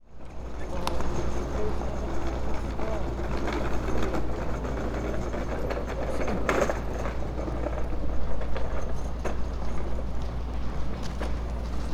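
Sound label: coop, Fukushima Soundscape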